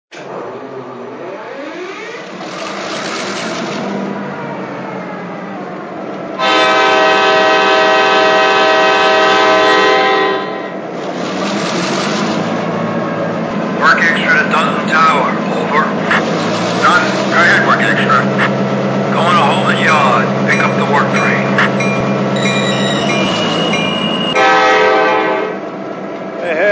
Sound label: MP15AC DC; LIRR